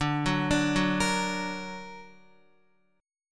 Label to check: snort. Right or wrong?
Wrong